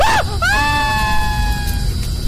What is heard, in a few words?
baby scream; ash